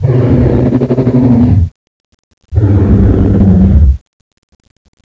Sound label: hide stun, zombie; Zombie Darkness; hidden